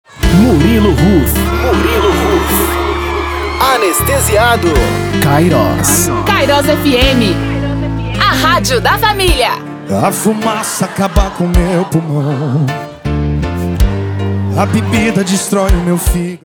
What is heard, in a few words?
intro music power